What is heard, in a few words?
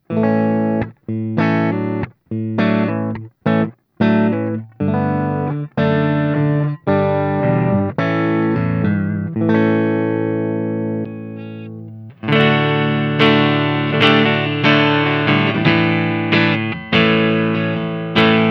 Carl Martin Ampster Green Low Gain